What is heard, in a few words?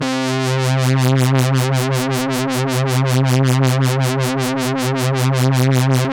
SYN WOBBLE; AMG - Now CD-ROM (Roland); Megabass 1